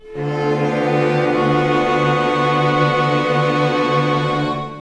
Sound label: Outta Chamber E, Strings; Total Drum&Bass; 90 s Sample CDs